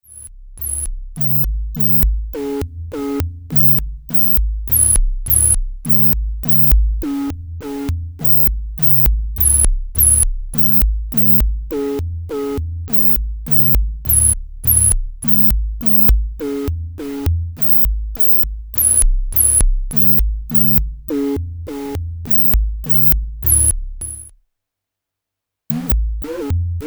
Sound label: Bass; LAMM RawArtistic PhilKullmann